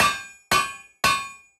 smithing table; Minecraft; Votive World

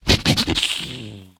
zombie pig angry; Minecraft; Votive World

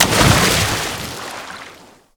splash, liquid; Minecraft; Conquest Default

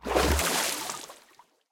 underwater exit; Minecraft; Votive World

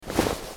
equip leather, armor; item; Minecraft; Votive World

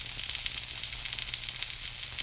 attack loop, guardian; Minecraft; Votive World

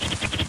hwacha fire; Minecraft; Votive Content; wows

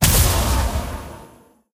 crystal middle; Minecraft; Votive Content

